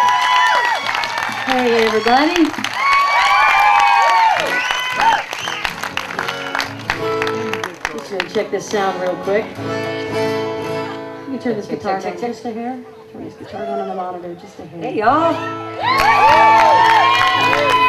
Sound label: soundcheck